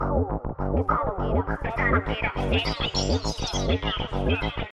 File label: Bassline tb303